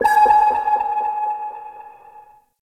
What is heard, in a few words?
Supply Cache Nearby Ping; Gameplay; Warframe; Venus Open World